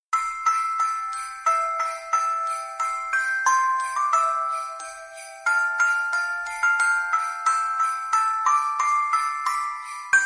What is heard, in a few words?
christmas jingles